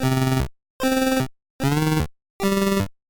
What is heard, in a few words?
instrument volume pitch